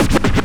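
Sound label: scratch, record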